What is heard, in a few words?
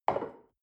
shell upside down place on table soft short; soundpool